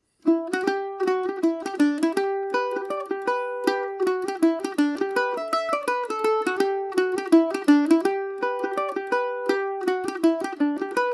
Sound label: solus gmaj paris swing, mandolin